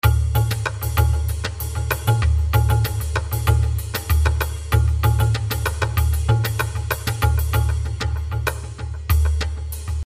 Wonder Drums

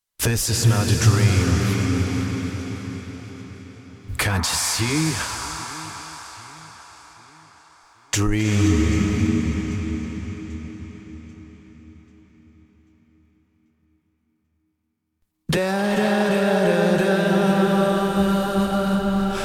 vocal mix fx

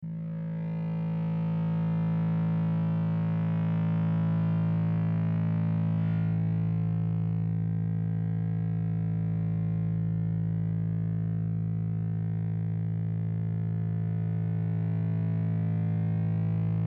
A Drone on Ebow

